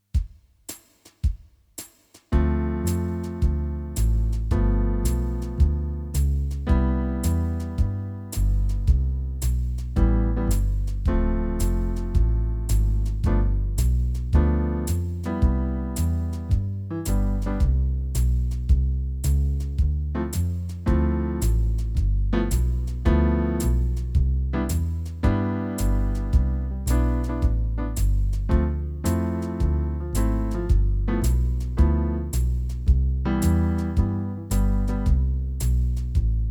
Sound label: accomp II V I maj